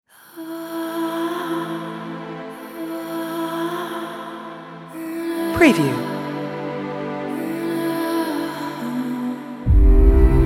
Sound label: Emotional Nostalgic Dramatic Strings Film Score Sad Female Voice